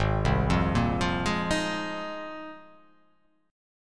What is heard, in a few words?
G sharp 6th Add 9th; 4,3,3,3,5,3,5,x; down; chord shape